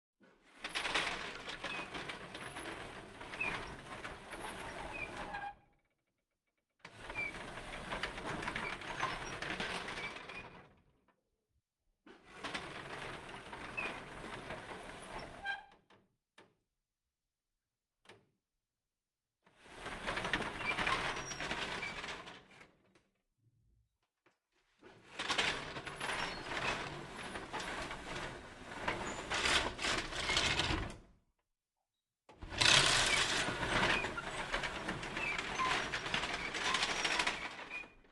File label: doors window wooden shutter open and close close perspective; Sonniss GDC